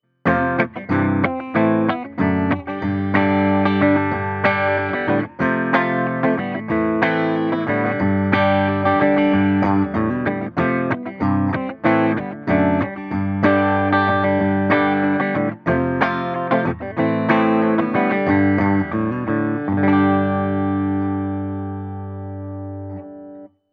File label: Clean; Peavey Vypyr X3